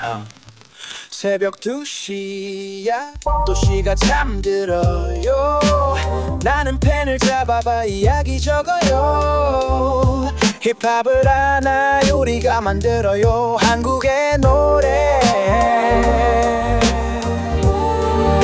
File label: boom bap M; acestep